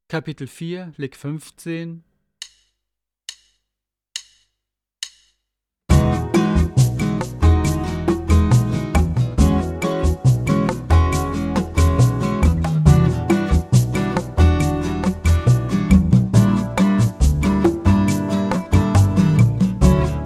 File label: Latin Style Guitar